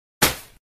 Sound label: confetti